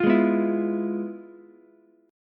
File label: F Minor Major 7th Flat 5th Ukulele; FmM7b5 strummed; JGuitar